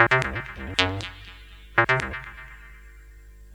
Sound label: Synth, Techno